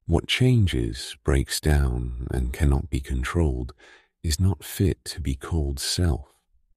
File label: drop, remix